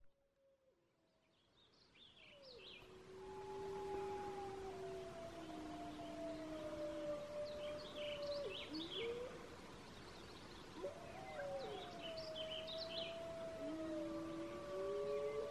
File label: wolf howls